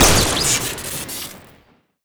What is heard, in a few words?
self preserve shoot crit, weapons; tf2classic